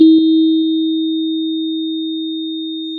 pop, Sound of a church bell

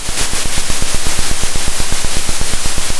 pop, Sound of a drum roll

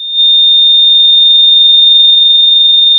Sound of a tea kettle whistling

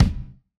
Kick, drum

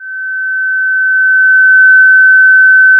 Sound of a police car siren

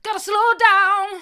gotta slow down hard, vocal

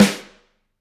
room Rim, drum

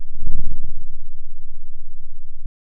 pop

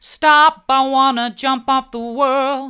stop i want to jump off the world mid, vocal

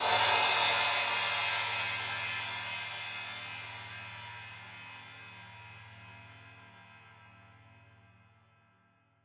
Multi Crash Hi, drum

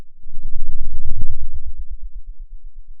pop, Sound of a thunderstorm

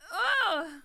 anger strong; VIVAE

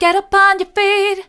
get up on your feet soft, vocal

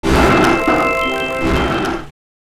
snare based to noise

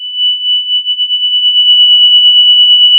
pop, sound of a tea kettle whistling

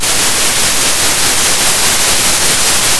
Sound of a thunderstorm

